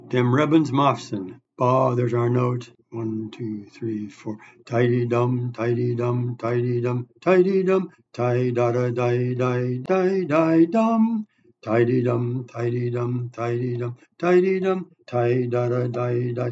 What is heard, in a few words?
Bass; Dem Rebns Mofsim; mofsim chorus